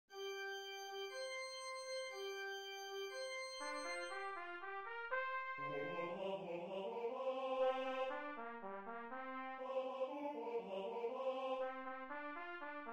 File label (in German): halleluja alt